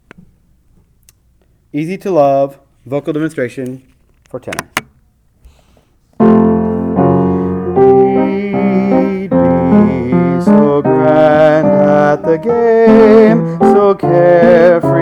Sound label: vocal